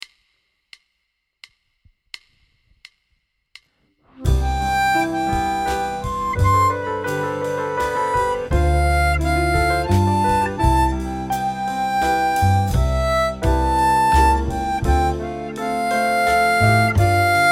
Santa Lucia C 85 harp

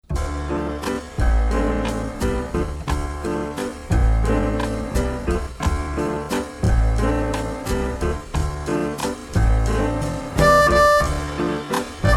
slow harmonica

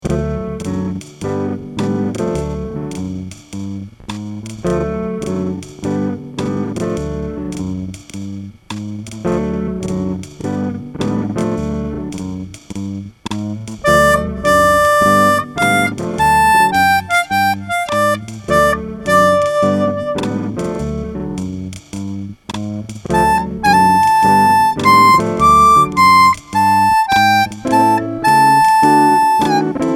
slow harp

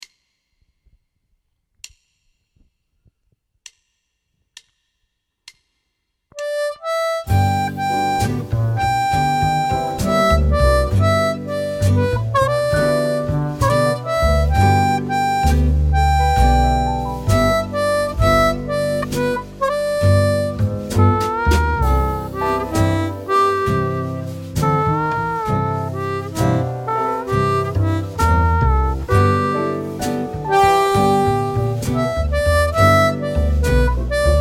G 140 harp

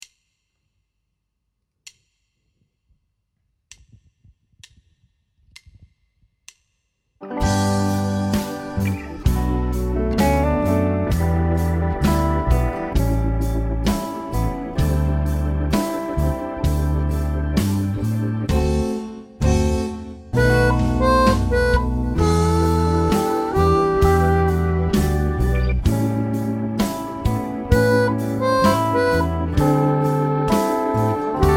65 harp

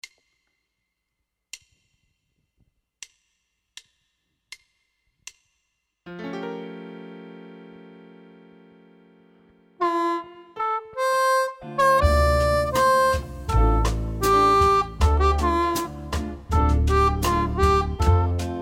F 80 harp

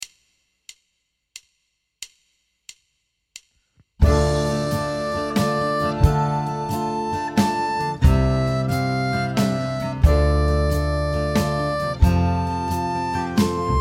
Scarborough Fair 90 harp

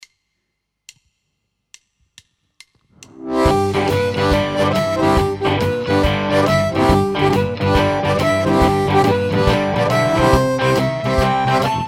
Shuffle Boogie 140; Быстрый пример; одиночными нотами при помощи техники блокировки языком

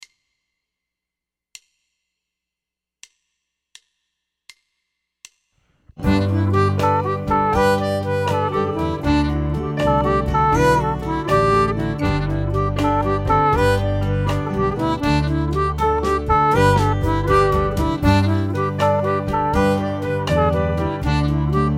Irish Jig 80 harp

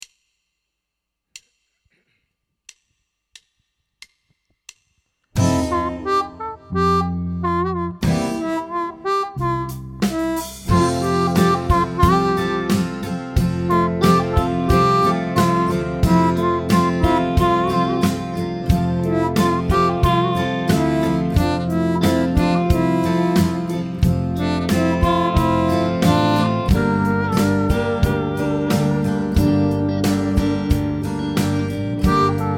F 90 harp

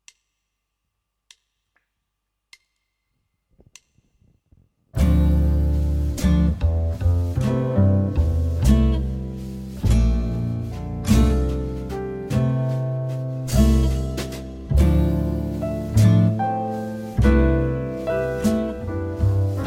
Hello Dm 49 harp

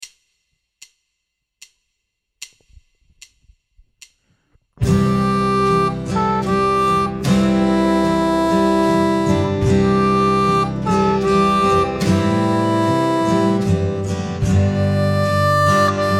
C 75 low harp; Silent Night

